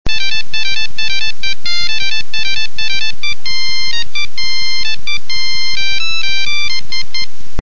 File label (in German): Marsch